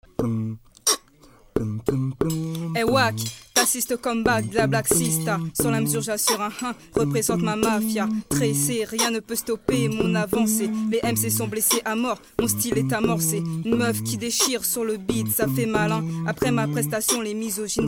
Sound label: beat box à table